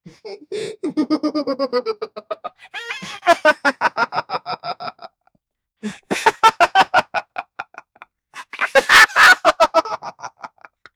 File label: the hyena laughs a nasty; mammals